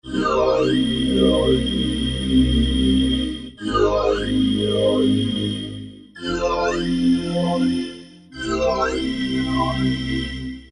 vox Filter

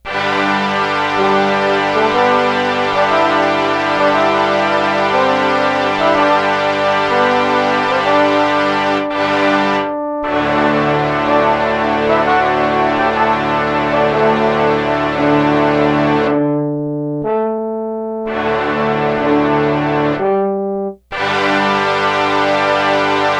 orch brass section french horns muted trumpet

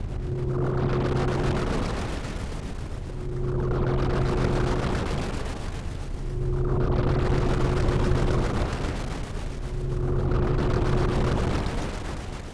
Syntonic Generator

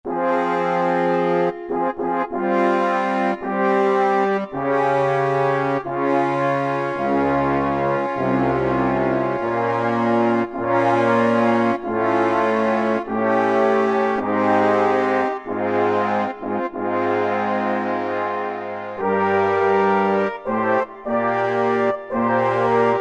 French Horns, brass; Factory Voices

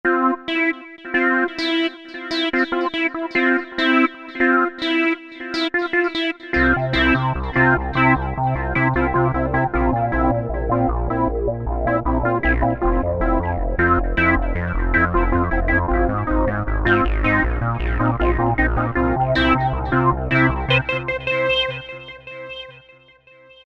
JP Velo Saw, Roland SR-JV80-11 Techno Collection

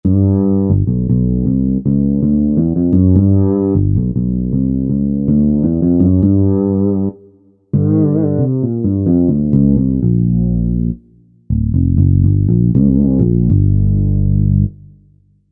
Fretless Bass, Korg TR-Rack

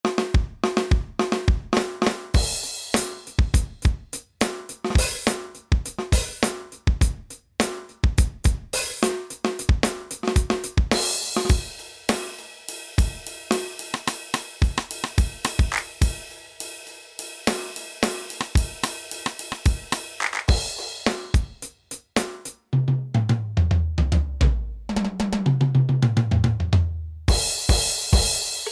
Basic Kit

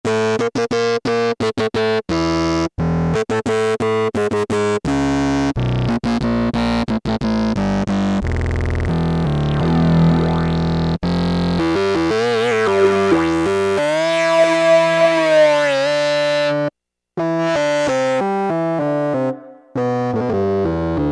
fuzz buzz

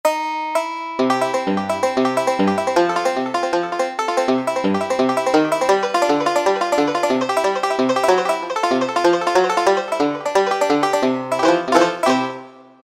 Banjo, Roland SC-50 Sound Canvas; Factory sounds

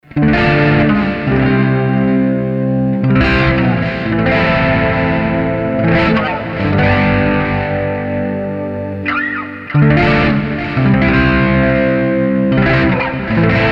Flang-A-Bit; Sony HR-GP5

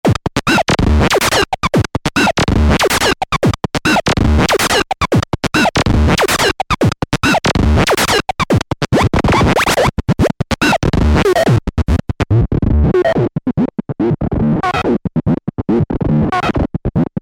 afx beat, synth

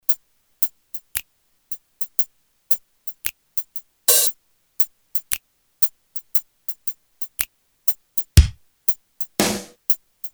Drum Box; t.c. electronics M300

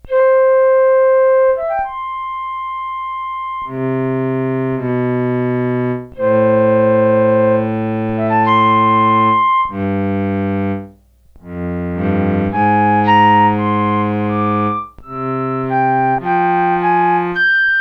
solo cello flute

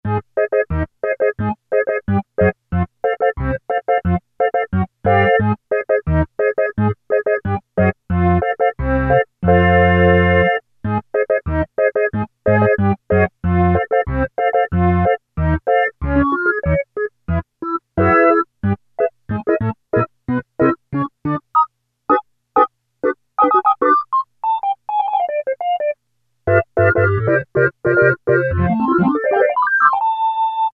Pearl Drop; Tones

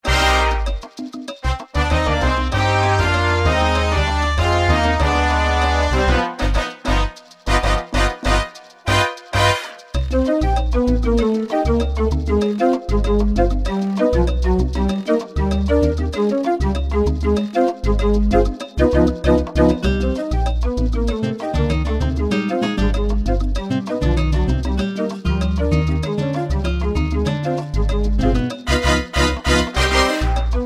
Salsa; Alesis Z7 Latin; Factory demo song; Salsa Siete

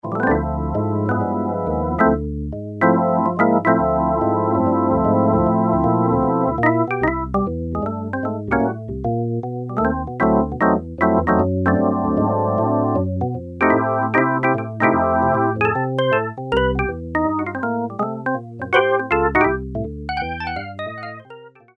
Double Org 2, Roland U-110; Factory Patch

